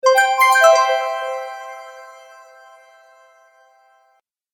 News Message, Cartoon; Cartoon Sound Design Sound Effects

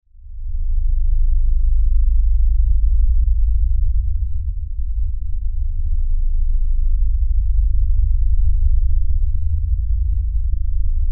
Lfe Drone Low Bass Rumble; Blastwave; Sound Design

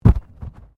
Body movement Fall Heavy

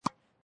Tennis Hit Ball with Racket Distant; Lynne Music

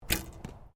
ARCHERY BEGINERS BOW FIRING; Various archery sounds; Miscellaneous Sports

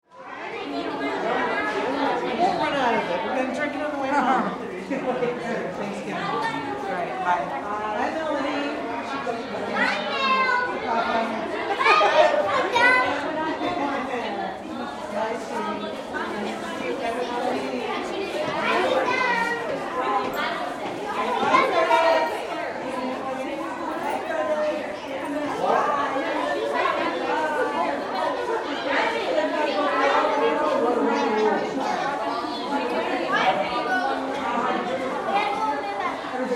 Ambience Elementary School Hallway Children Walla; Schools; SFX Bible 6

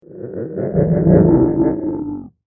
Mutant Monster; Monster Sound Effects; horror

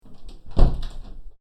Closing A Wood Door With Metal Door Handle; CraftedSignals

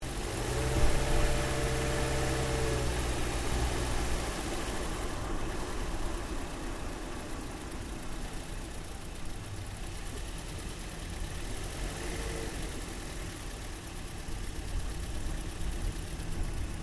Car Toyota Yaris Engine Drive Slowly; Toyota hire car; Vehicles; Cars

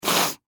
Human Male Sniff; Sick Sound Effects; Sick (Sound Effects:Human)

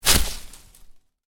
Foliage Impact, Hard Foliage Hit; Impacts; Miscellaneous Impact

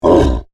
Monster Grunt, Horror, Monster, Grunt, Creature

